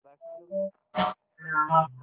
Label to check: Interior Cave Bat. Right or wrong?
Wrong